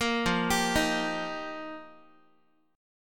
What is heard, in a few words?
F 9th Suspended 4th F Guitar, chord; arpeggiated; JGuitar